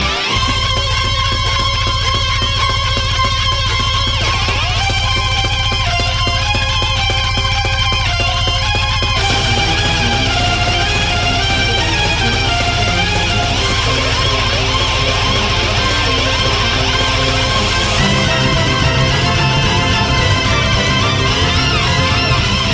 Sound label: Hungarian Rhapsody